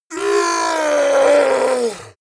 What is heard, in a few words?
yeti attack shout aggressive painful; schreie